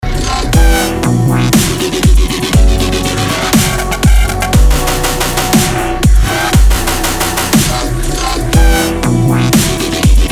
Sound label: dubstep